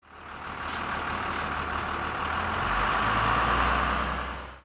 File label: bus